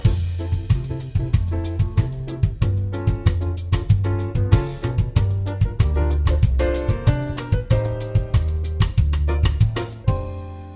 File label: Brazilian Trio